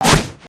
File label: cannon jump